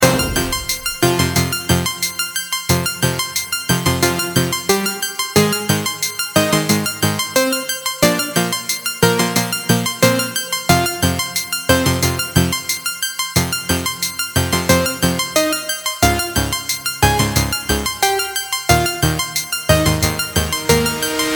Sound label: stage select; CPA Skill Check